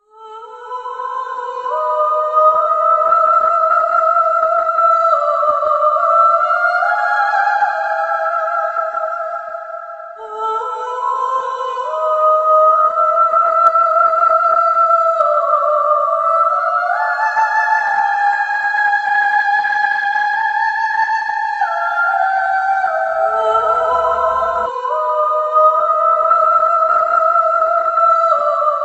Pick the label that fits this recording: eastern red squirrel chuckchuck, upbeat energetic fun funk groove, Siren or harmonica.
Siren